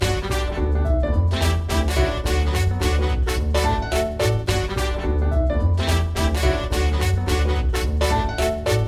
music powerup; Tom and Jerry - Freefalling Tom; NextlevelGames

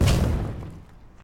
sfx land; cannonbolt; Ben 10 - Omnicode

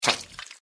squish; Danger Mouse - Ultimate

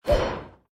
interface play; Adventure Time - Break The Worm; ThrillGames

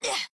hurt; Ben 10 - Omnicode

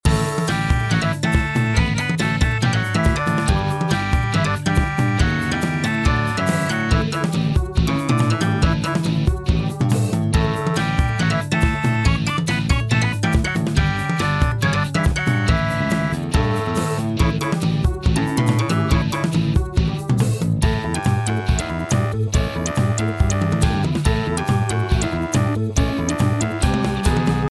music; The Loud House - Extreme Cardboard Racing; NextlevelGames

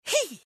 jump; Danger Mouse - Ultimate; penfold

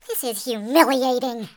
lose; alvinn sticker; Nickelodeon - Destruction Truck Derby

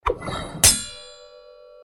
fire attack; finn; Adventure Time - Elemental; ThrillGames

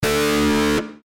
fire guitar; Adventure Time - Elemental; ThrillGames

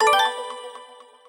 bonus pickup; Crash the Bash - Drink Break

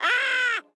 "ahh"; Bunnicula - The Cursed Diamond

